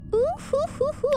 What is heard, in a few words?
hoofufufu